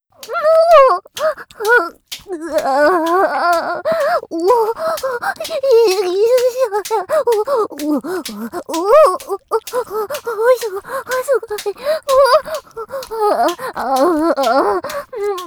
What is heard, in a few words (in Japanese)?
おほ声ハードセックス; 清楚ビッチエルフ姫のメガ盛りマントロフルコース